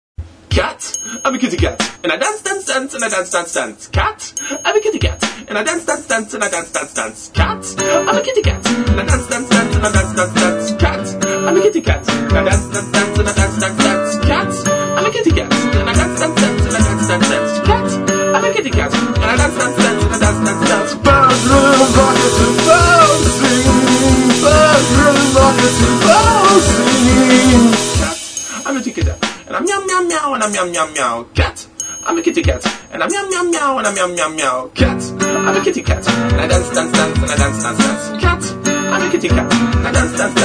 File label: Kitty Cat Dance Song